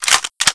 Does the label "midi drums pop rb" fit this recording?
No